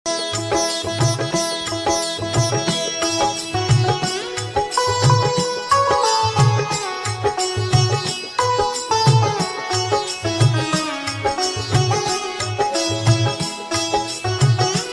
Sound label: hanuman chalisa instrumental ringtone